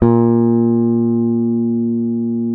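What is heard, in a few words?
P BASS SUSTAIN F#2; Sampleheads; Will Lee Bass Library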